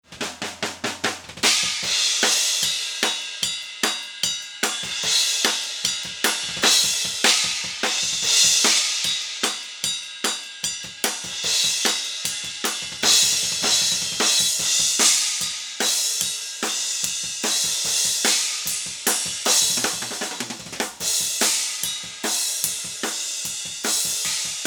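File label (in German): Tube Tech PE1C Overhead rechts LF 100 Hz Boost 0 Atten 10 HF Atten 0 Boost 9 wechselnde Frequenzen; OH rechts; 4 bis 12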